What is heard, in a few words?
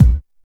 tight low end kick drum one shot a sharp key, Royality free kickdrum sample tuned to the A# note